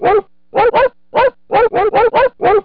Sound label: dog